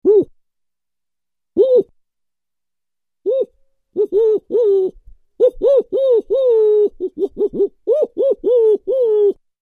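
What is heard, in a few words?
owl, imitation by voice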